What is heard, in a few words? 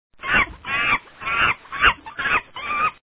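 chimpanzee; simpanz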